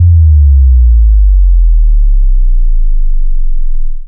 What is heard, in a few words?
Low pulse